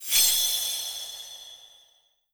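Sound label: Stage Clear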